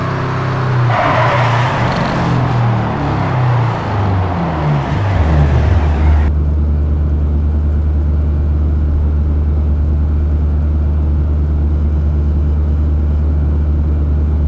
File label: throttle off, vehicles